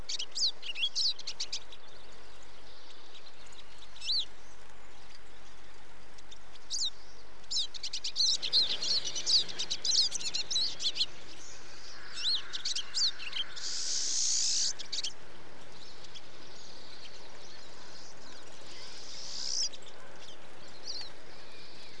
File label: Pine Siskin